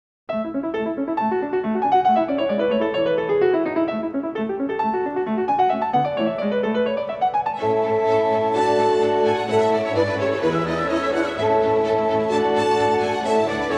Piano Concerto No. 23 in A Major, Allegro; Mozart, Wolfgang Amadeus

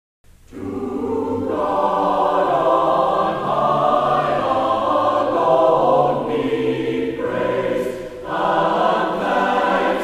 Choir; To God On High Alone Be Praise

